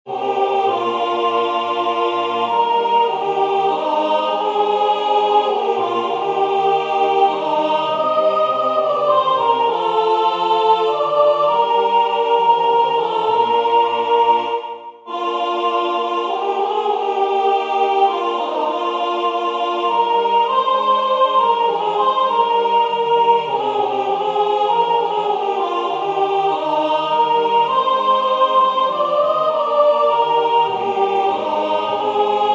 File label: Hymne à la nuit Soprane; Concert du 13 décembre 2024 en l'Église St Pierre - St Paul